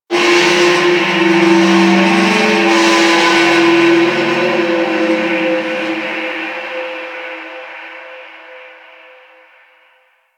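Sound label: Distant Metal Bend, Shipyards; Warframe; Grineer Shipyards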